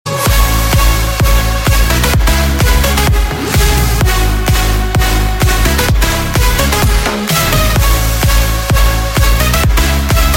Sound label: lullaby edm